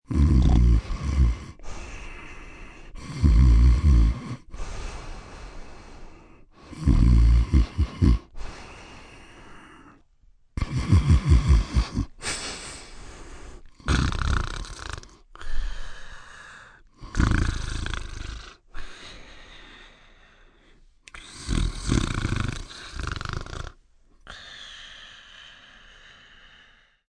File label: Snore Snorting, Snoring, male, snorting, deep (human voice); Sound Effects:Human; VoiceBox